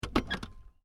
Car: Boot Open, Exterior; Cars; Vehicles; Stuart Duffield

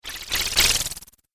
Cartoon Magic Spell

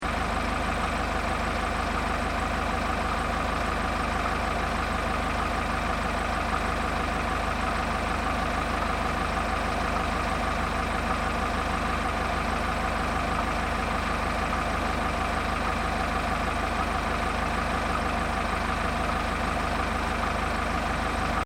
Tractor Earthmover Idles Close Loop; Tractors; Vehicles

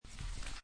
Playing Cards Gather Cards From Table; Casinos

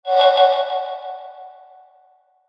SWEEPER XYLOPHONE, Sweeper Xylophone Reverb Wash; Production Element Imaging Element Accent Transition; Sweeper Sound Effects

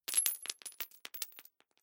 Dropping Nuts And Bolts On Concrete Metal Rattle; Drop Sound Effects

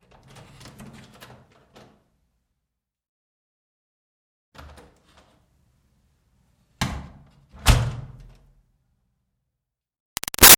Door Wood Church Basement O-C Lite; Miscellaneous Doors; Big Room Sound

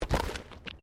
Body movement Slide Road; Body movement foley to simulate falls and deaths; Bodies; Human

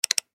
Button Switch

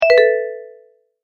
Multimedia Tone-Stop

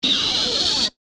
Energy Charge Short, Science Fiction

